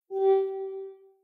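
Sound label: sounds of operating system mac os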